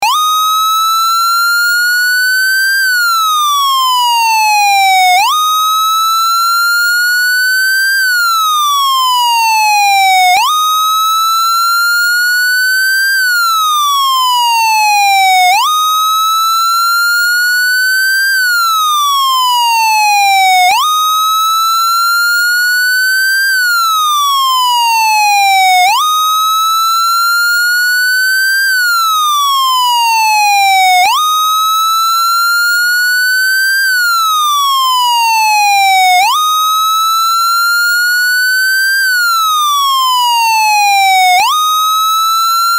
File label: wail; 911signal